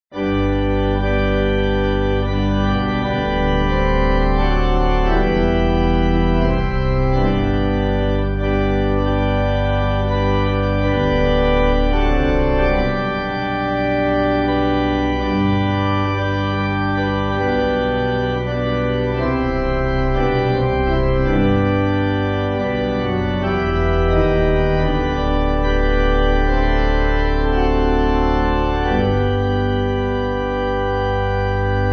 Amazing Grace Pipe

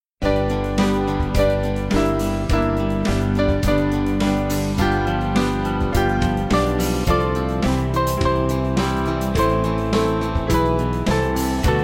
Open Mine Eyes, Band

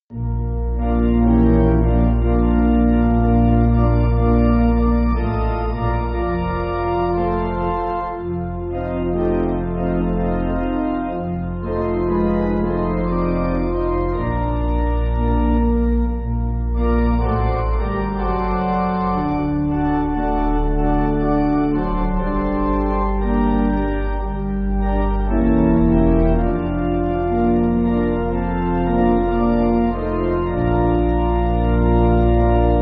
Pipe, church music

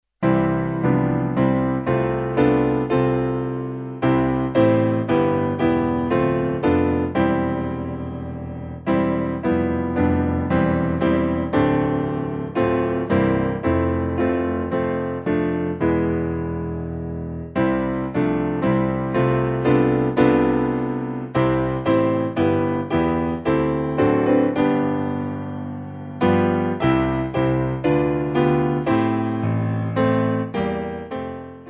Piano; Bentley

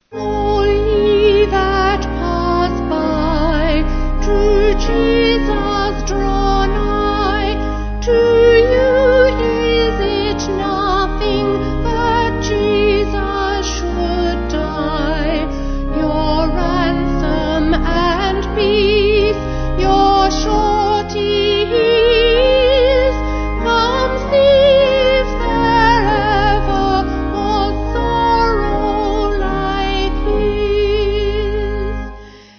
Vocal Organ

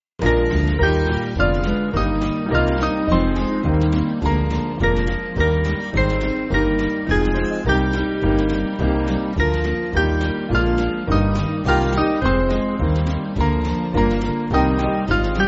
Hail To The Lords, Band

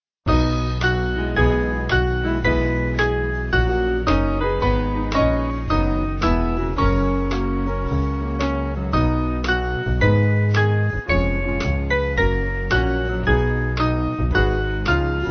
Monk Band; Angel Voices; Swing Band (CM)

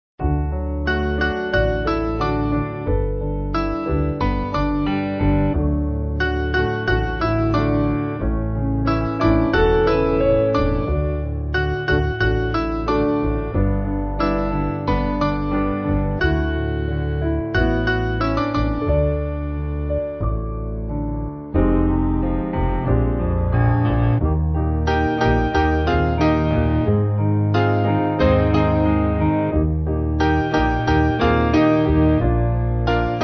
Piano

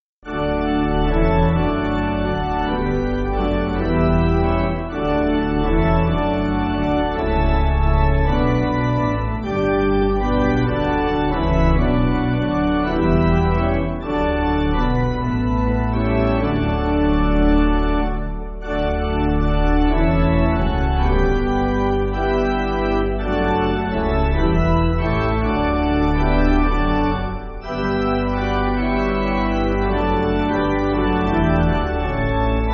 Pipe, church music

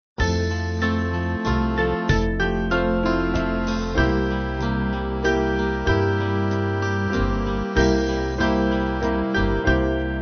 Band

